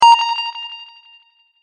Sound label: Beep Simple Delay, A Simple Beep With Additional Delay; Multimedia; CraftedSignals